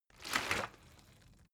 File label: bottle water sparse upend soft; berufe